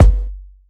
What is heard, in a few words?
Kik; Rawcutz - Nu Forms